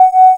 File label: PAD; Sound & Vision; Gigapack I CD 2; PAD SYN-PADs; PAD Micro-Pads